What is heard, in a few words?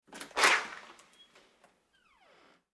Household Door Garage Open Weather Stripping; Garage Door Sound Effects; Garage Doors (Sound Effects:Doors); SFX Bible 6